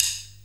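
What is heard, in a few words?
snare drum rim shot; 90 s Sample CDs; Best Service Dance Mega Drums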